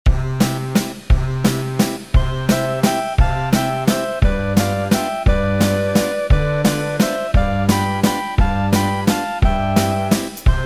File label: Karaoke; MP3 bez melodické linky